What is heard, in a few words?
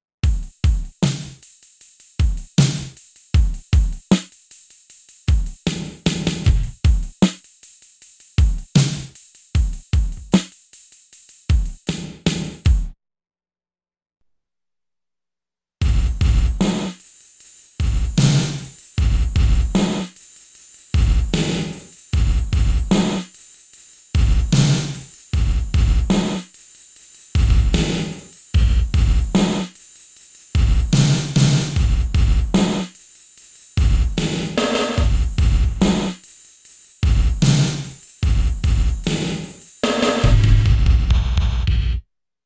DRUM GATE